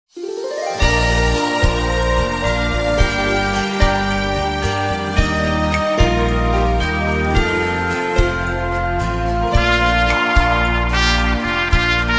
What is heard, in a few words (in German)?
Trompete